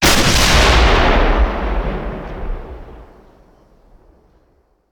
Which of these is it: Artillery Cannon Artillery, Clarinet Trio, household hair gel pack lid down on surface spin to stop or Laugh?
Artillery Cannon Artillery